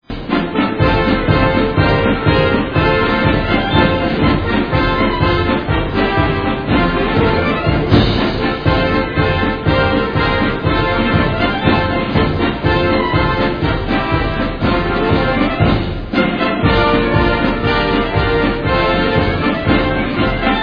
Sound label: USMC Hymn